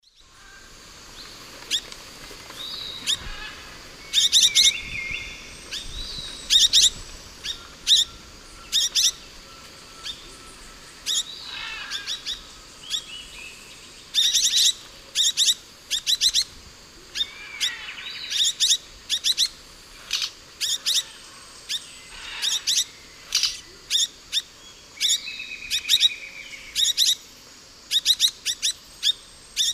Euphonia rufiventris, calls and song 2-3 birds; S. America; Eastern Lowlands

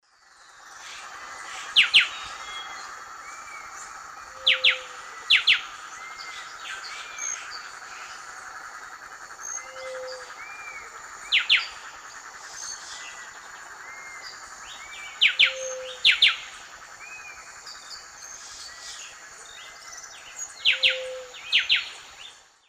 Cantorchilus leucotis, song; S. America; Eastern Lowlands

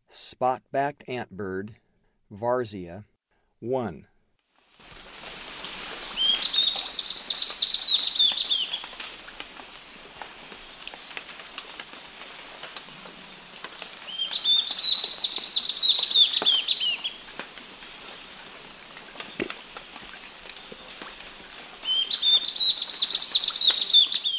Spot-backed Antbird (varzea); S. America; Eastern Lowlands

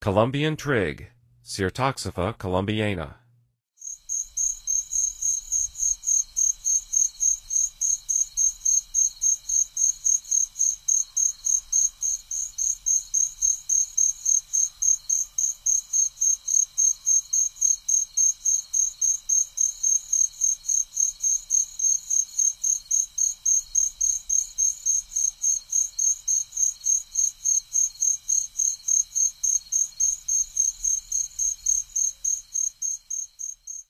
Columbian Trig, Songs of Insects; N. America

